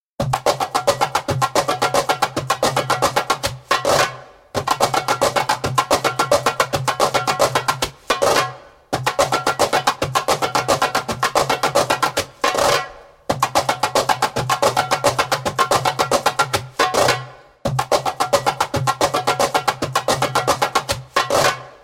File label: Smooth Transitions (fast), Djembolale; Djembolale - Fills and Drills